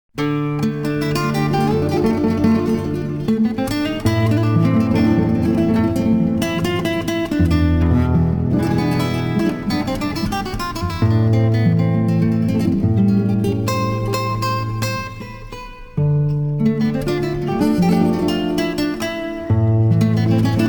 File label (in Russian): гитара